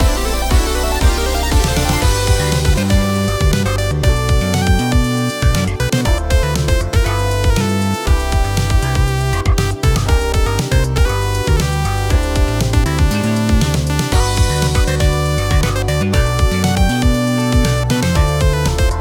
Pet Menu; Protocorgi

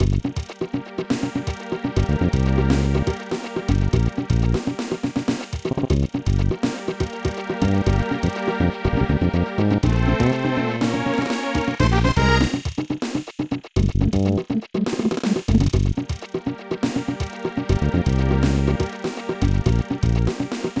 Dungeon; 3x3 Eyes Seima Kourinden; SNES

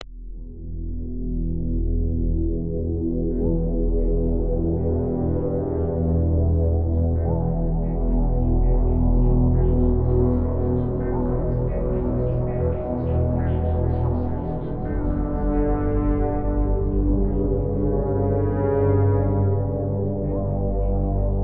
Destroy; Stormrise

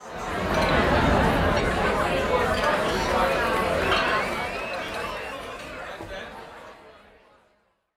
PARTY AMBI

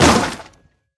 Trolley Crash; Level3

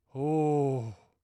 Startle; White Servant

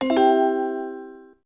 Cha-Ching

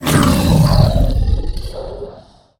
Roar

